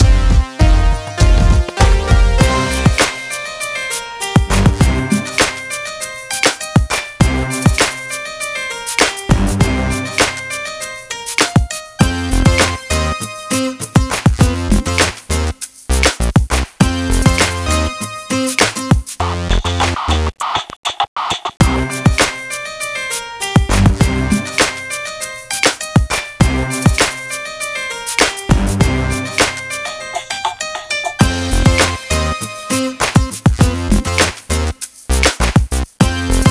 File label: Hiphop Boucle; Imagine Modern Dancer; Nintendo DS